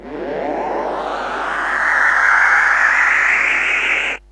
rise, fx & sweeps; EZRollers

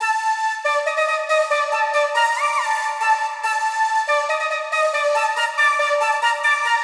Flute 140BPM Emin; Expensive; 99Sounds x Monosounds; Instrument Loops

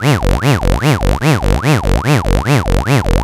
LFO, Noise; Sonic Foundry (Sony Creative Software); Prototechno

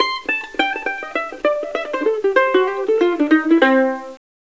Mandolin C; Foundry (Sony Creative Software); Hip-Hop Street Beats Construction Kit; ClassicCountry

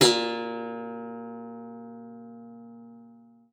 A#2, GUITAR; Xsample VOL-2

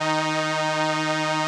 SOLINA, String Machines

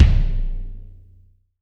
BD, Real Mega Drums

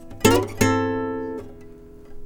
NYLON RIFF, Nylon Guitar

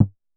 Drum X Conga D, Pearl

